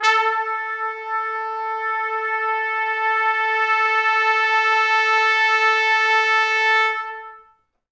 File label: A3 -R, Pop Brass; Best Service; ProSamples vol.19; AKAI